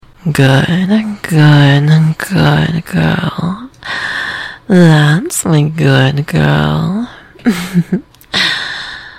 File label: music stinger; killstreak music